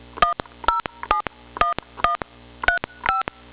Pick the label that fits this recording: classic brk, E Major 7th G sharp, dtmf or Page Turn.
dtmf